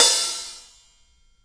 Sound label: Hi Hats; Instruments & Percussions; InVision Interactive; Lightware VOL-1